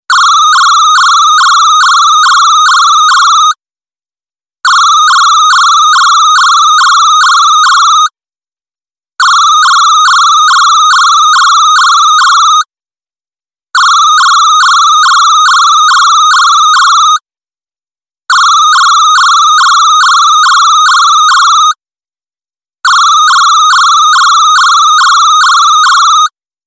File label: Alarm ring